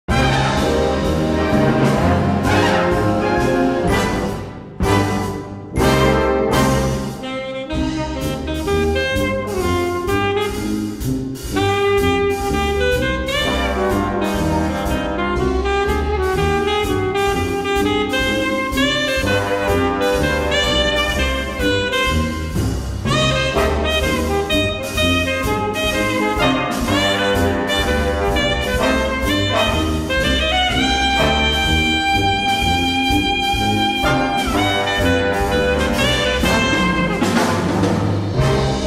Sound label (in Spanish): Concierto Para Saxofón Alto y Banda Swing